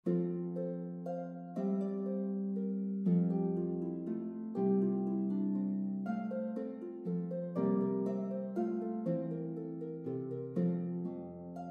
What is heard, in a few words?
Celtic Harp, F Major; Prelude for Celtic Harp: No.1 in F Major